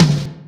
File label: tom, drums; virtual drum sounds